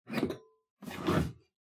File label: Pendulum Clock Hardware Open